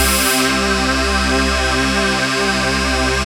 SYN, DANCE SYNTH

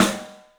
STEEL S, drums; 3D Sound

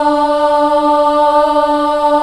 D3 child ah, choir; USB Soundscan vol.28

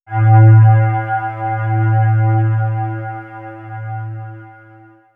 Swirls Ambient Drone, pads; X-Static Goldmine

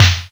snare exciter

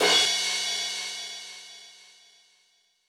Crash D#2, Roland U220

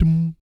BS DMM, Vocal Planet Jazz & FX; ILIO; BASS DUMMS

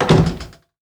Door, household rhythm samples Hits

